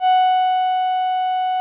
VP-330, String Machines; Best Service; XX Large Most Wanted 2

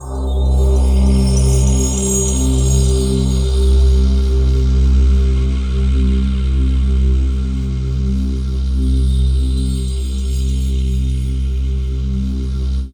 pad C, Synth Pads, Chillout; Best Service ProSamples vol.36

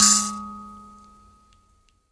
bush mbira A 3; Heart of Africa

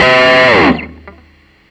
ATTACK GTR, GUITARS KIT; Big Beat Samples & More; AKAI

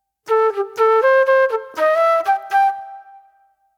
Soul Plate Flute

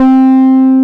TB C5, TB-303; Masterbits; Vintage Voltage

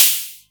HatO, Roland MC09; Drums

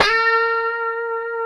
B 4, COMPR STRAT, Guitars; Spectrasonics; Hans Zimmer Guitars VOL-1

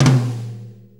6-8 Tom Flam, Drum Loops; Sonic Foundry (Sony Creative Software); Loops & Samples Drum Tools; Flams

